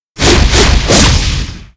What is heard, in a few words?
Big Yeti Skill; Seer; GamigoNA